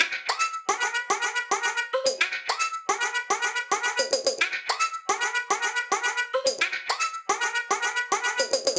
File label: Vocals Chops